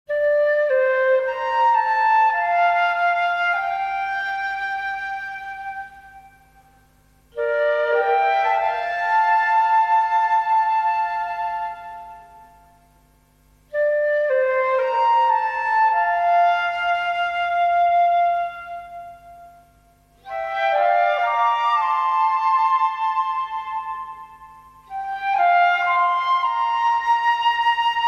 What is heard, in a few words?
GRACEFUL FLUTES